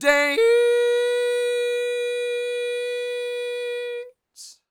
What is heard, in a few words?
DANCE; Spectrasonics Vocal Planet